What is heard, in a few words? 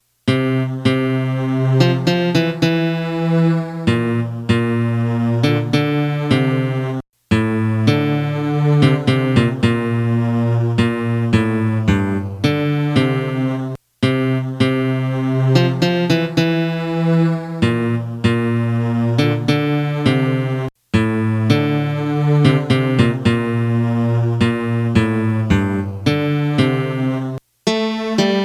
melody; southern songs